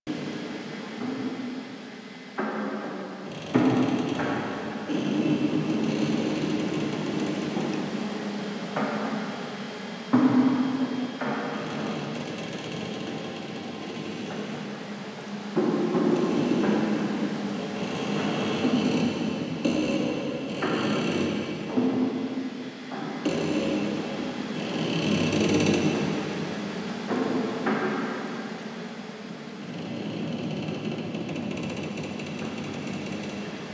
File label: Ambience Loop, Interior